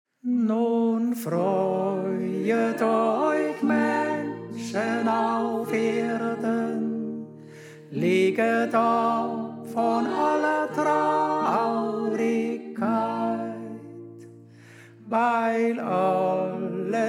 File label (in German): Tenor; Nun Freuet Euch Menschen Auf Erden